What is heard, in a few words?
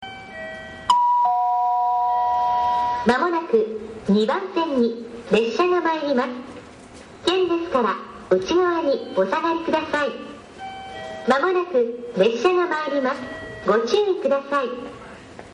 sekkin; gotemba